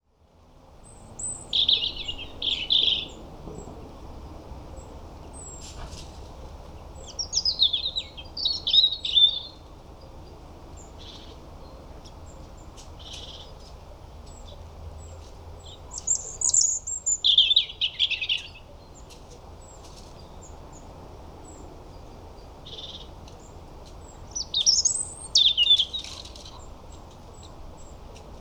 Roodborst Erithacus rubecula

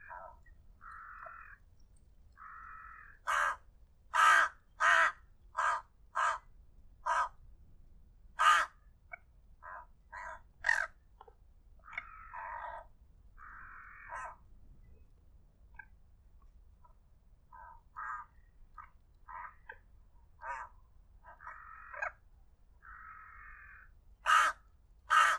Roek Corvus frugilegus

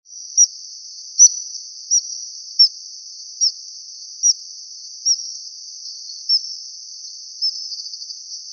IJsvogel Alcedo atthis

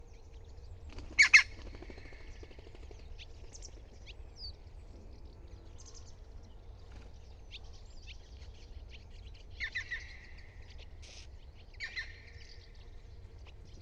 Groene Specht Picus viridis

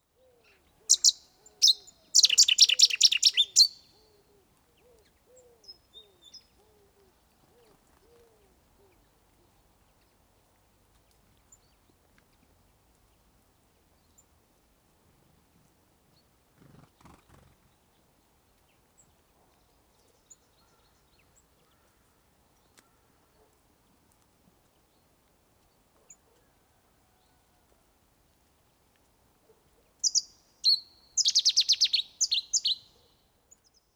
Cettis Zanger Cettia cetti

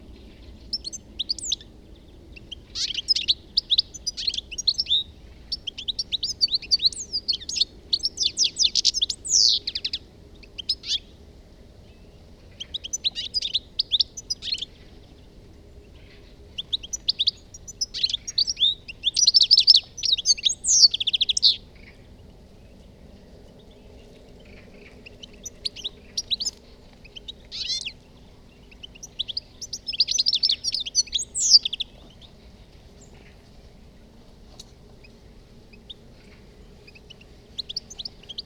Putter Carduelis carduelis

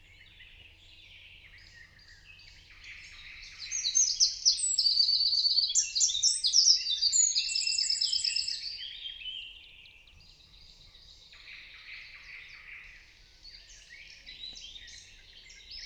Winterkoning Troglodytes troglodytes

